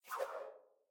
Card Pack

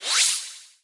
Music Frontend

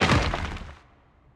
Aoe Stun

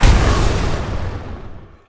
Quake Spell Impact; gamerip; Hero

